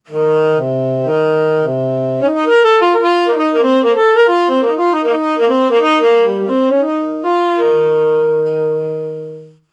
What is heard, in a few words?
smooth crystal saxophone